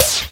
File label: Claps & Snares